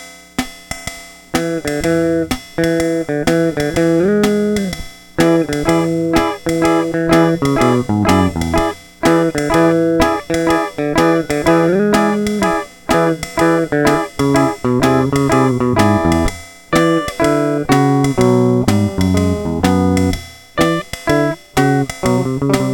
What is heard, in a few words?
russian bear rag